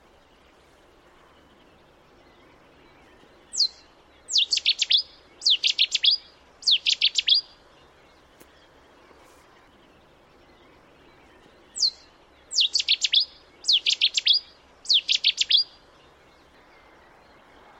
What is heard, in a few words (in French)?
bouscarle de cetti; chant